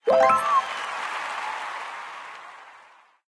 Ovation

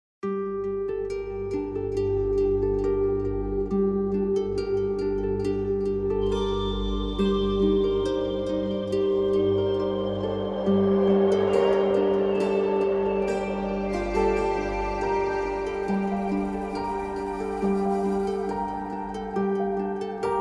Instrumental, Romance; Halsin

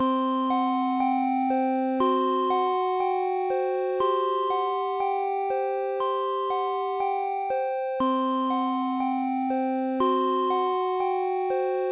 Scary Music High; A Day in the Office

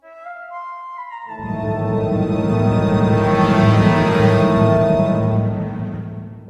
Sting; winx club ps2 windows gamerip 2006

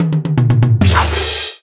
Character Attack

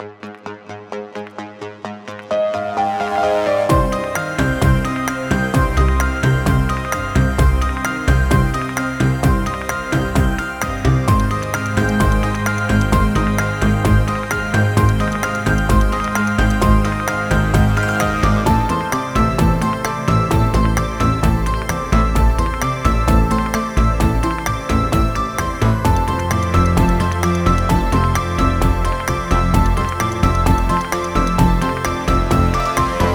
Game Show Suspense; Wobbly Life

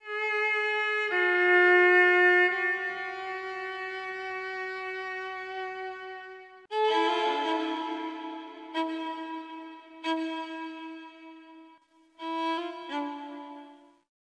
distant violin; dark fall ii lights out

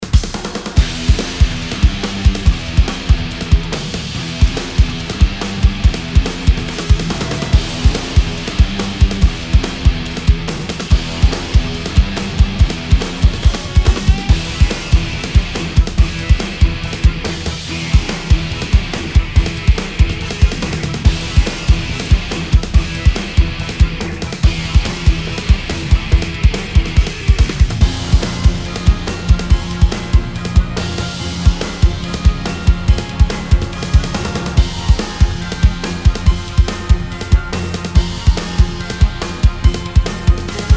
Whale Boss Music; End of the Mine

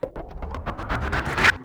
Bumper

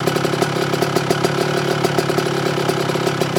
bike idle; Ringling Bros. and Barnum & Bailey; Wii

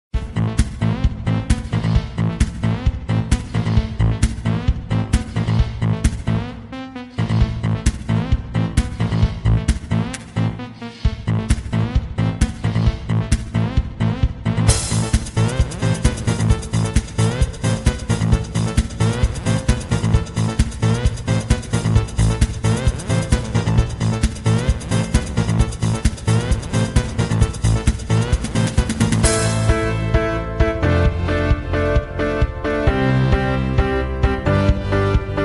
Level Track; block breaker 3

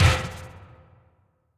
Mechbull Hit; super mombo quest

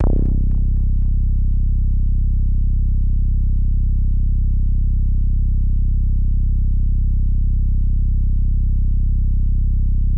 Bass; Catmaze

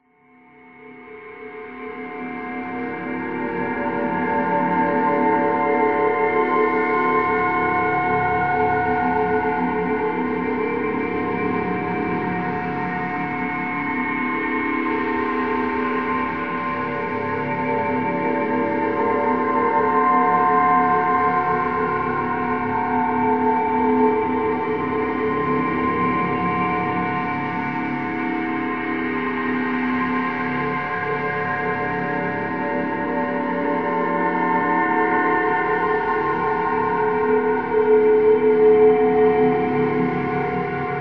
Eerie Metallic Drone; Hydrophobia

